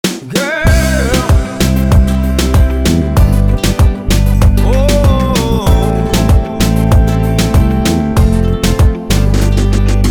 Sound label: REGGAETON